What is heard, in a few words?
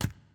reel Bounce; netent